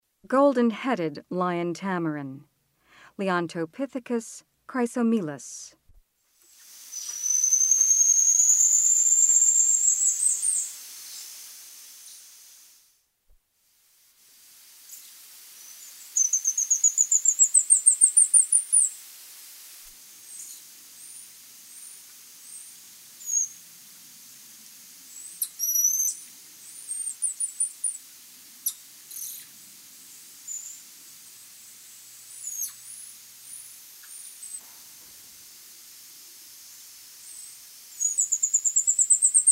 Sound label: Golden-headed lion tamarin; S. America; Rainforest